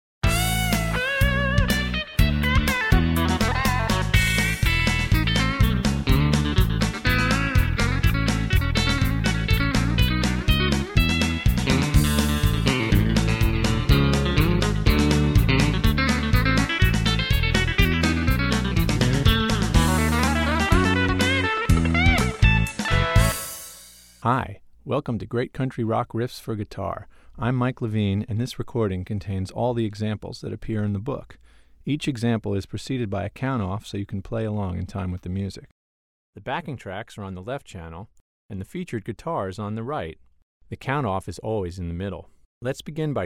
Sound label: Country Rock